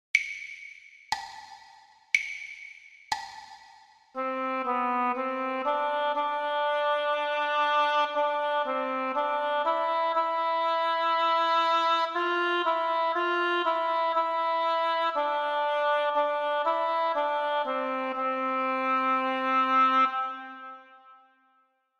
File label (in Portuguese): percepcao ditado